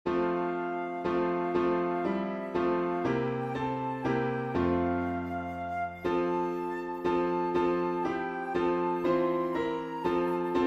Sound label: flute and piano; beginner flute solo with piano; Holy God We Praise Thy Name